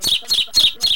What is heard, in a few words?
bird